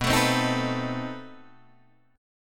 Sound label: B 7th Flat 9th B Guitar Open D